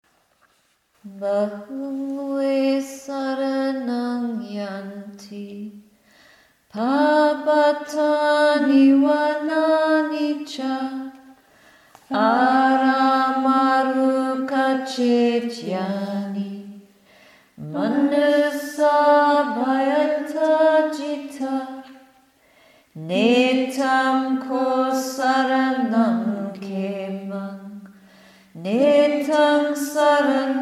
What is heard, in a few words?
Chant; Bahum; Buddhist Women on a Path of Spiritual Awakening